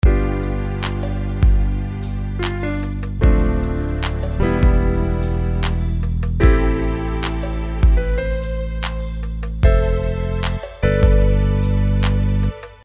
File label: K2600 Troubletown